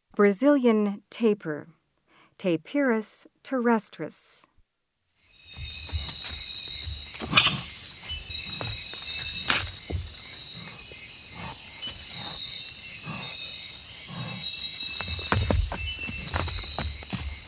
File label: Brazilian Tapir; S. America